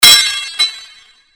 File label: Sword Sound; FXHome; Fighting Sounds